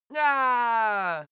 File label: male attack, schreie